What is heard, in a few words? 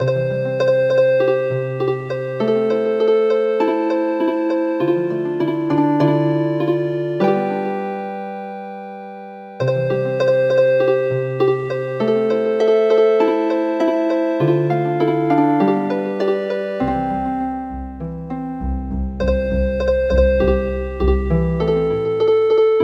Healin Harp; Bunny